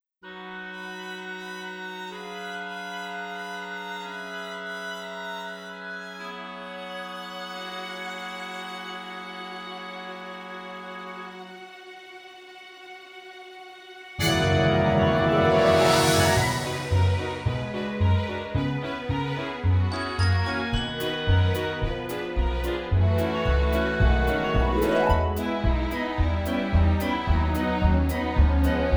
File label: Accompaniment Backing Tracks; GLINDA